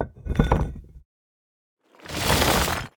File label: remove stone